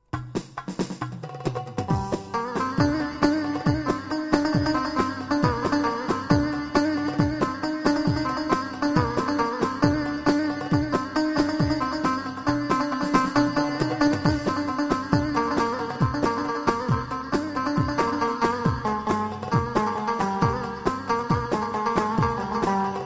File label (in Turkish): Korg PA 55 TR 4 telli; 8 telli